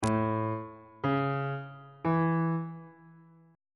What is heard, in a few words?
f sharp aeolian chord 3 is a major